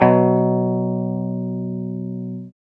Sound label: guitar 5TH